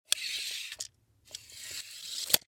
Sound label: Industry Tape Measure Pull